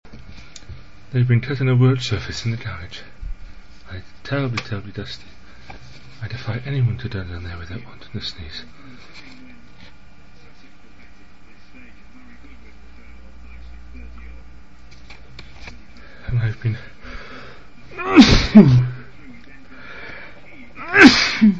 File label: dusty garage, male